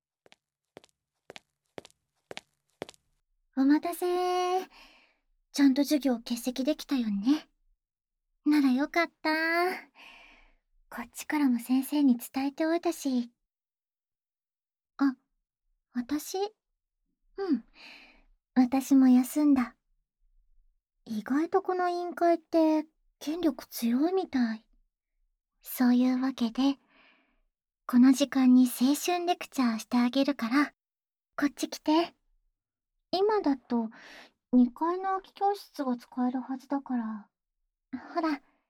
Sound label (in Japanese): 唾液少なめの耳舐め, ASMR; こんなキスもあるんだよ？; 青春べろちゅー委員会